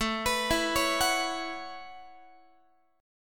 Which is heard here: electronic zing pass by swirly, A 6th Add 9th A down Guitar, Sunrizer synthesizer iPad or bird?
A 6th Add 9th A down Guitar